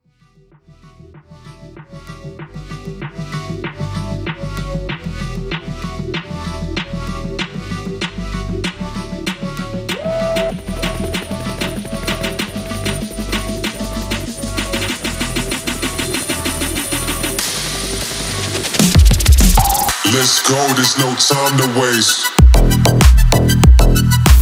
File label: Helicopter